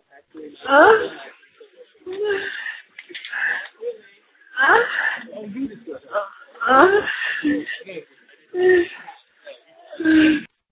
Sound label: orgasm